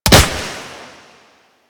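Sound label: Flak Cannon Projectile Hit; Grineer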